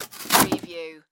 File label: Cardboard Box Open